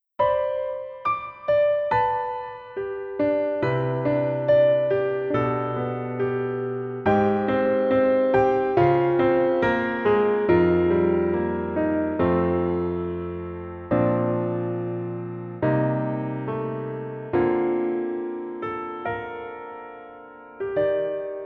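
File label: Piano version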